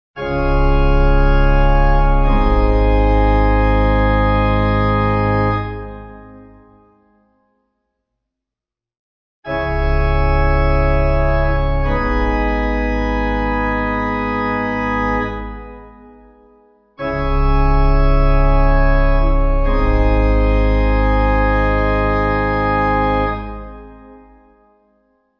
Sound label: amens simple Ab pipe